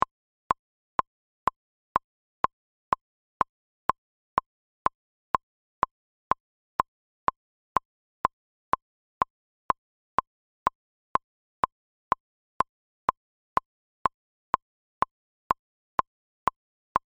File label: metronome 124 bpm